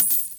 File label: Consume Coin Sound